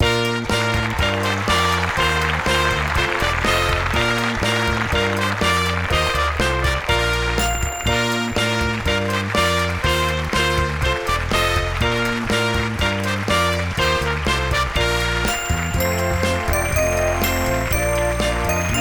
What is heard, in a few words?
Finale 122bpm, Loops; audiocam music